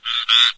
small frog, frog groups; ambient; random animals; Left 4 Dead 2; Source Engine

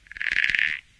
small frog, random animals, frog groups; ambient; Left 4 Dead 2; Source Engine

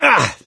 hurt minor, gambler, survivor voice; source engine; left 4 dead 2

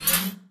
editor panel raise, ui; Source Engine; Portal 2

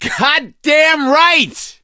positive noise, gambler, survivor voice

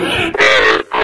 zombine pain; npc; source engine; episodic

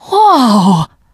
violence awe, teen girl, survivor voice; Left 4 Dead; Source Engine

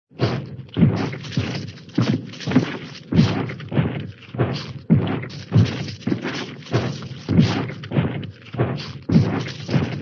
footsteps, cartoon, giant footsteps

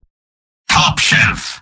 spy positive vocalization; tf; mvm

